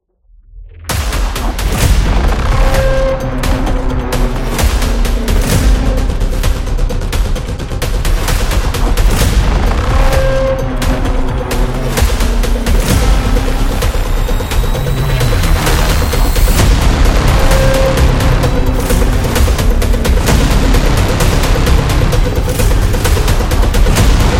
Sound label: A Horror